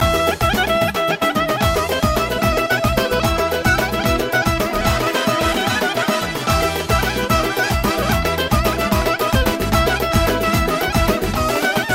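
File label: Clarinet Armenian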